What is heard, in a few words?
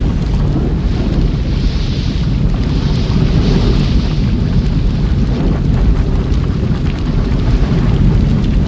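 ambience lava pool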